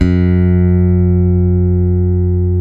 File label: PRECISION BS, Bass, G 3; Spectrasonics; Bass Legends